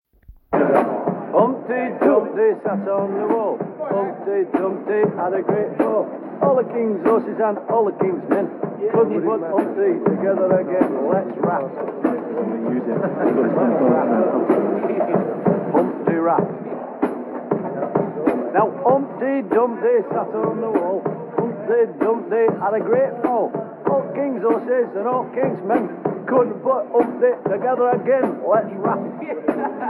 Rap

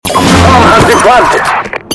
Bomb

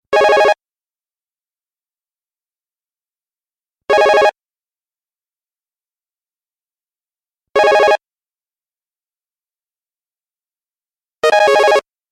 Digital Cell Ringer

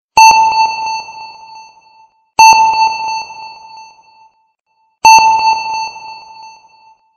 Submarine Sonar

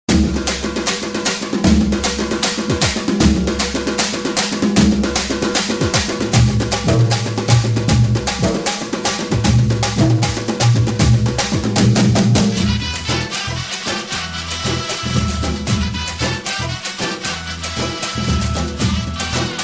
Tamil Tone

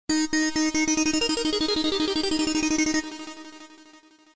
Pleasant

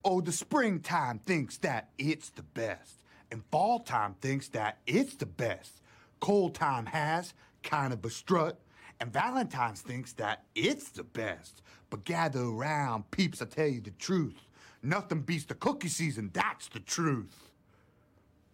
Song

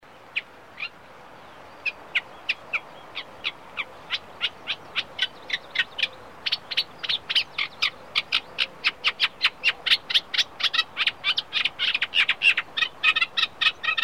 Reed Warbler

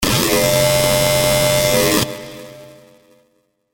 Growl

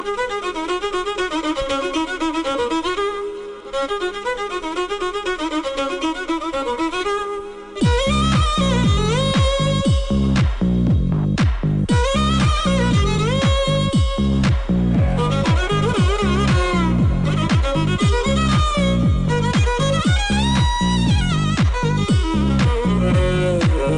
Tamil Beat

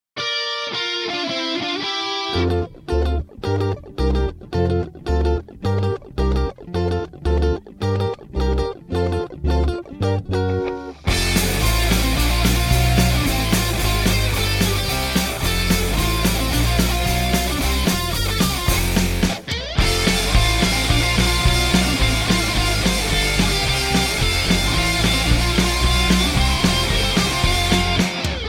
rock

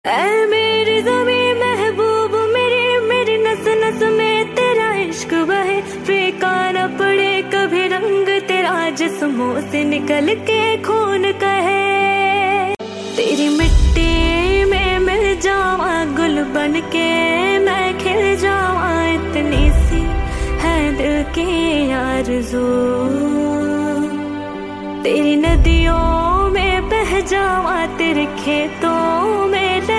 Female

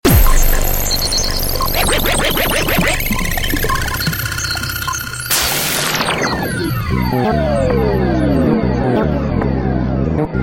Techno

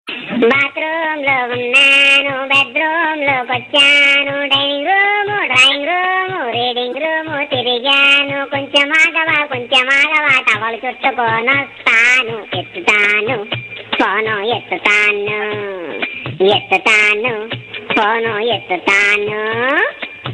Bathroom

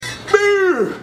birrrl

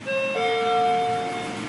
Elevator sound

